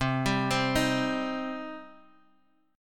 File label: C Minor, Guitar, Open G; down; chord shape